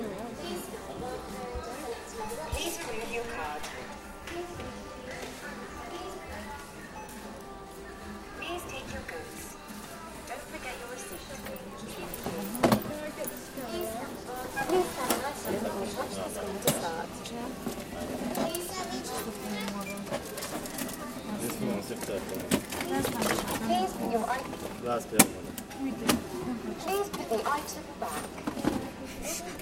B&Q stereo self service soundscape

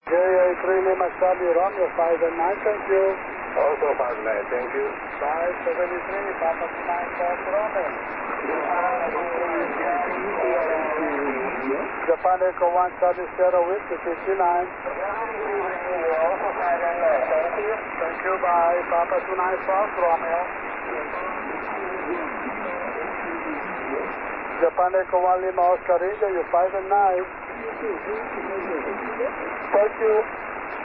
21.240 MHz SSB

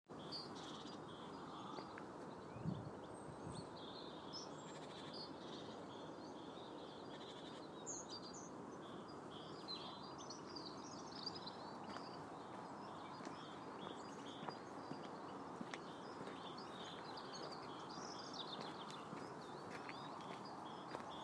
sound of spring

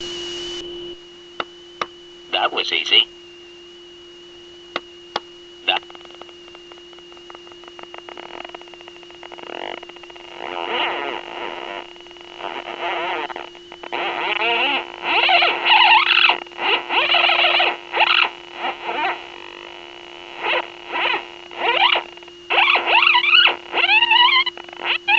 duet for easy button and laptop fan

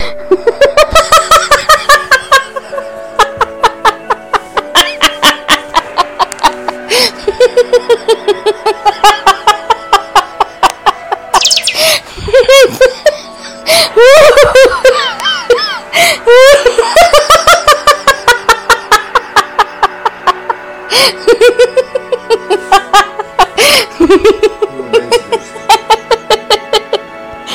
drunken giggles

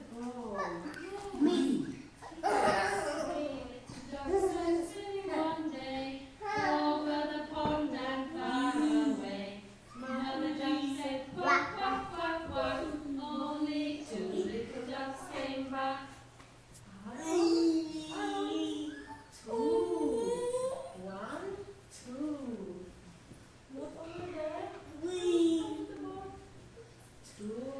purple class singing

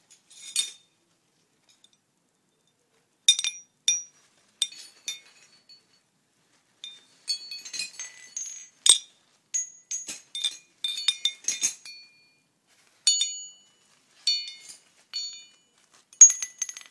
medal sounds

